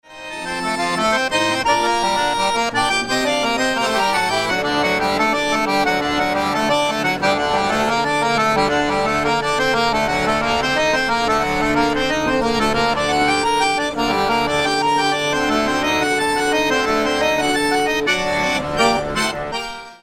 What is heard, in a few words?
Musik Mic Sens High; Pocketrak CX